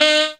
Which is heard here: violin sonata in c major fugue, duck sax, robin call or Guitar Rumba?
duck sax